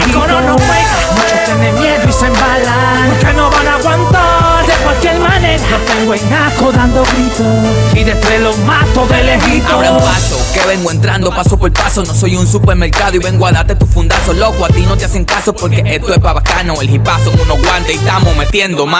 mi vida coro

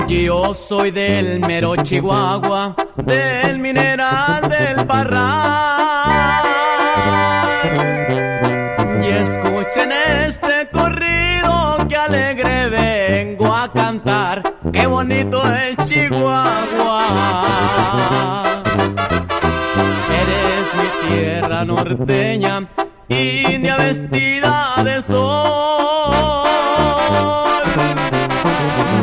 corrido; chihuahua

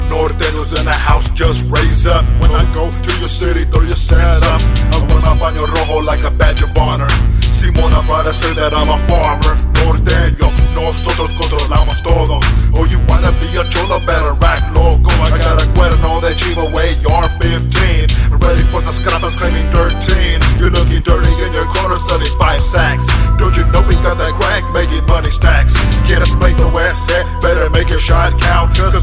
altanero norteño

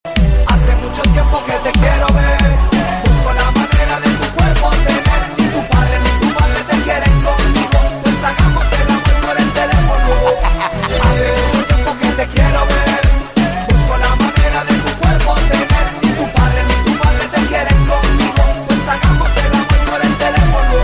Telefono Tono

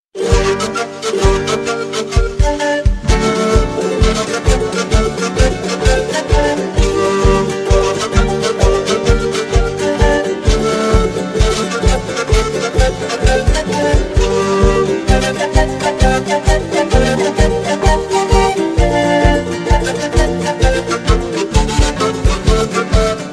Diablada Punea

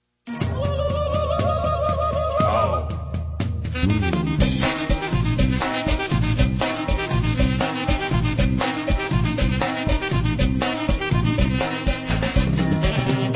cumbia

